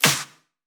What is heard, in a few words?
Pre-Shifted Claps; Vengeance Samples